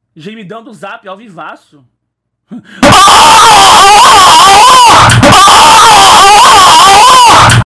alert; shibinha

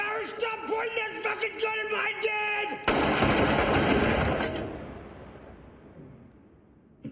gun pointing; reservoir dogs

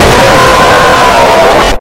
jumpscare sound; fnaf plushies 1 v4

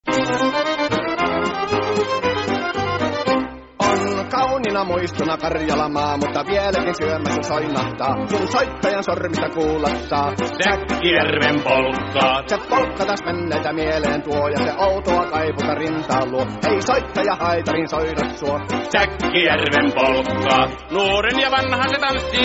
polkka finnish song

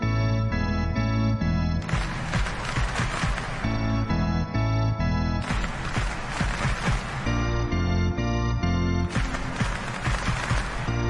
piano

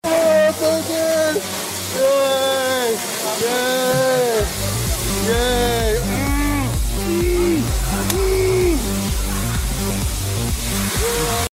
yaaay yaay yay mmmhh mmhhh mmmhh; dyrus after winning lcs

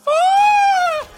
scared

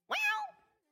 surprised meow

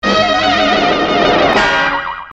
jet screamer

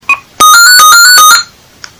gigaset prime loud

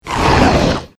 roar; spino

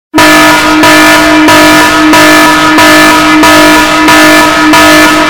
alarm

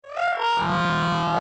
windows me sound

